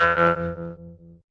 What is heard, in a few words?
vintage cartoon boing; Cartoon Sound Effects Kit